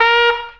MID PARP, TRUMPS BASS; Zero-G - Funky Elements

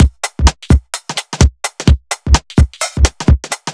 Beat, 128bpm, uk garage

